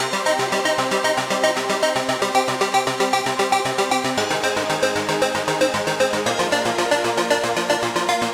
arps, a pretty classic detuned sound and a simple arpeggio pattern; Later on in the chorus I try to introduce some thicker synth arp patterns.; Rhythm Quest; rhythm-based